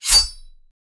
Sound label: fast sword slash sharp; action game